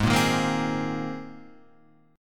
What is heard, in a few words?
A sharp 7th Suspended 2nd Suspended 4th